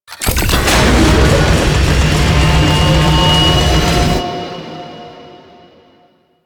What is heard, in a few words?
Install Part; Warframe; Rail Jack Hunt